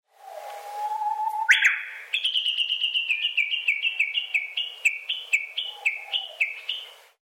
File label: Uguizu